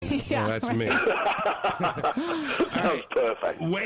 Laugh; Wade Barrett

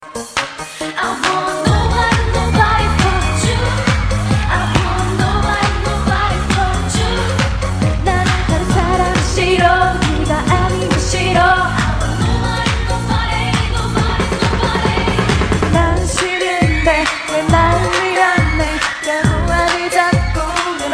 Techno Mix

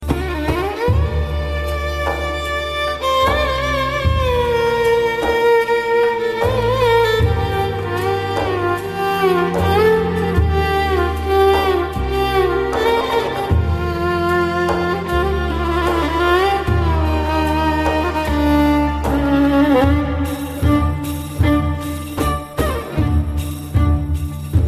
Arabic violin

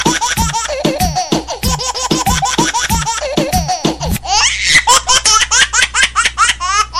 Rap Laugh

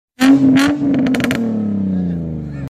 VQ35DE Crackle

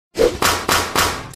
Triple Slap

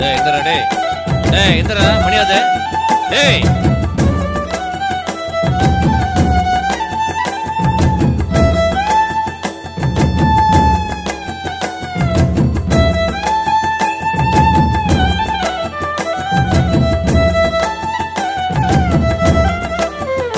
Bar Anthem

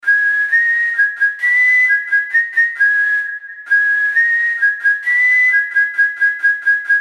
Whistle

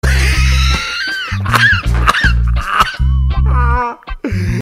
Wheezy Laugh; Dashie

